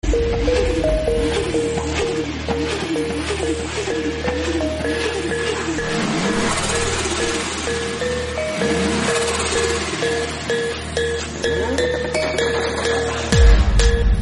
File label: bass boosted bgm